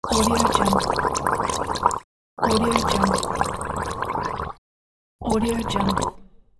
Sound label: gurgling